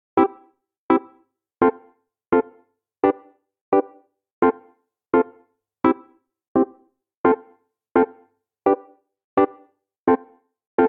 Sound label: Progression Reggae Upbeat Skank I - IV - V - IV C Major 85 bpm, Electric Keyboards; Ableton; Factory Packs